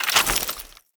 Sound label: Bayonet Melee Whoosh Gear Accent; Warframe; Tenno